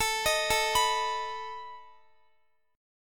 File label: A Suspended 2nd Flat 5th A down Guitar; Asus2b5; arpeggiated